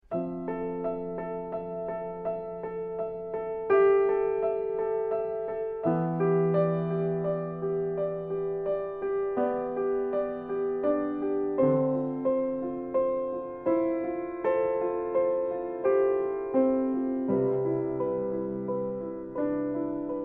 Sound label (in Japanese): ピアノソロ